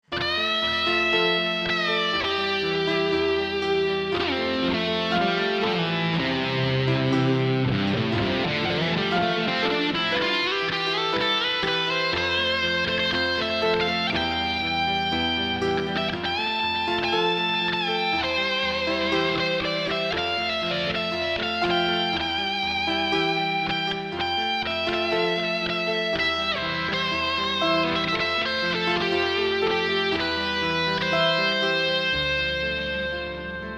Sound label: Classic Solo